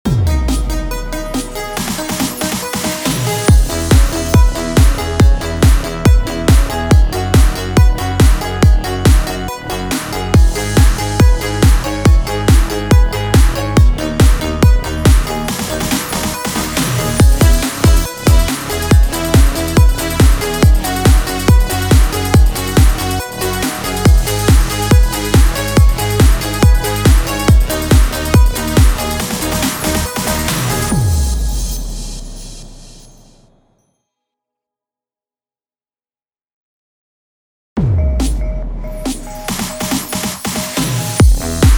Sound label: BED CHRISTMAS C#MIN 140BPM